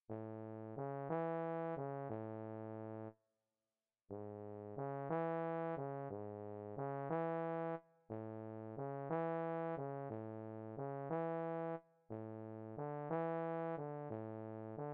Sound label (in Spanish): bajo